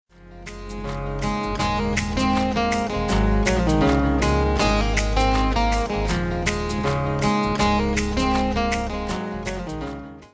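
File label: Lovely Guitar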